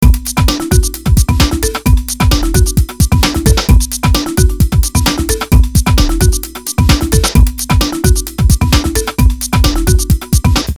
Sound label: dancing tribal vibing loop